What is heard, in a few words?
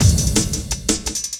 LOOP, Drum & Bass, 170 BPM